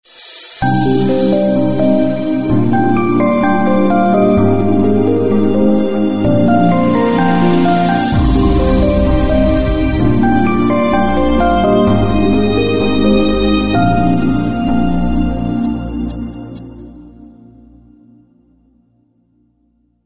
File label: Magic Bells